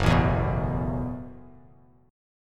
Gb Minor 11th Gb, Bass Standard, chord; strummed; JGuitar

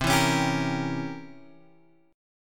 C 7th Flat 9th C down Guitar Open F; C7b9 strummed

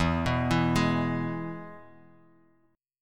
E Major; arpeggiated; chord; x,x,2,4,4,3,x,x

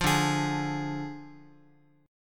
D Diminished D, Guitar; strummed

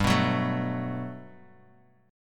G Major 9th, Guitar, Open G; down

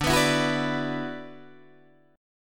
D 6th Add 9th D, Guitar, Open D

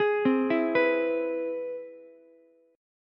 C Augmented Major 7th C down Ukulele; arpeggiated; chord; JGuitar

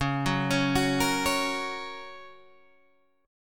Bb Minor Sharp 5th Db

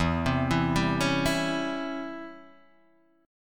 C sharp Minor Major 7th Flat 5th E, guitar chord

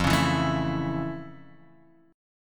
F Augmented Major 7th, chord; F+M7; strummed

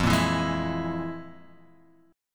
F Minor Major 7th Double Flat 5th; FmM7bb5 Chord {1 1 2 1 X 0} strummed

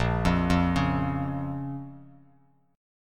Bb Minor Bb down, Bass Standard, chord; arpeggiated; JGuitar

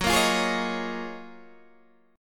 F sharp Major 13th Guitar DADGAD

